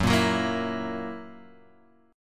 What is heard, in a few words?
F sharp 7th, Guitar, Open G